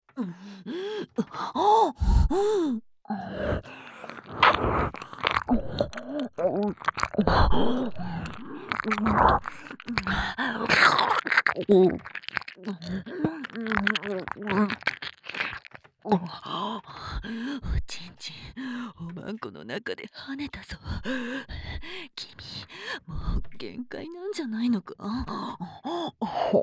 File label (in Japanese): 耳舐め; 抜きトラック